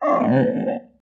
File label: dinosaur grunt; SoundsCrate